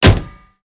Axe Hit; Explosion Large Deep

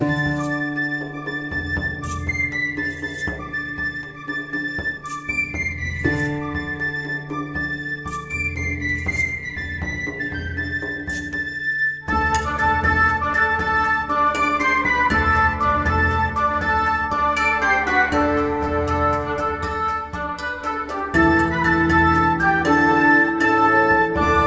medieval kitchen; Storytellers 2

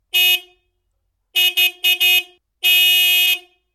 excavator mf 860 exterior horn stereo; sonniss gdc

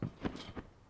trampoline realistic

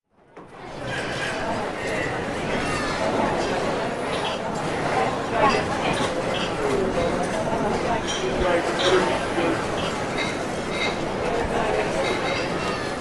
pm in motion busy cafe; fvtt sonniss gdc audio

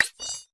navigation button; sonniss gdc

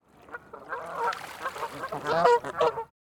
birdfowl goose coming out of water geese; fvtt sonniss gdc audio

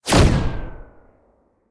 shotgun impact; lancer weapon fx

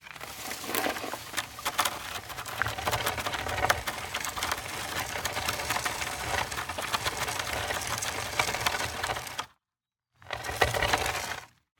heavy wood sliding on forest floor; sonniss gdc

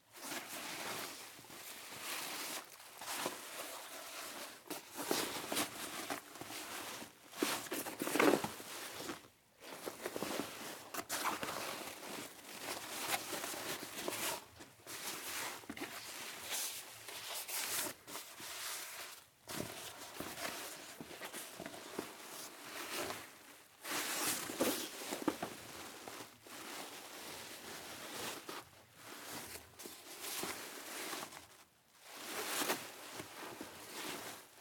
bag foley backpack small ikea polyester; prescence; sonniss gdc

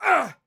grunt pain male scream; scream library; brfx

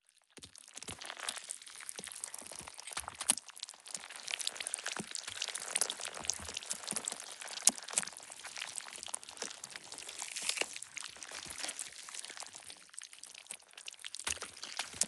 designed cinematic texture flesh gush; fvtt sonniss gdc audio

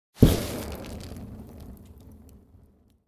melee attack impacts magical effects fire

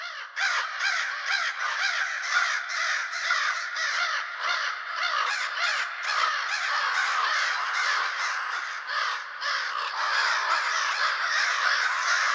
ravens constant; creatures

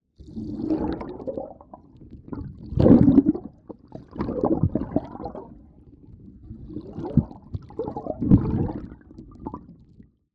underwater motion

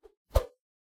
melee attack swoosh light; weapon swooshes

